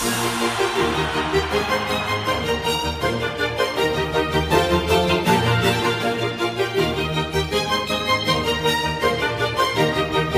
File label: classical music